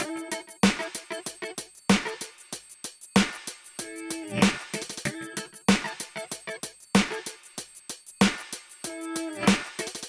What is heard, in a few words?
Guitar drums; 095 Emin; AppleLoops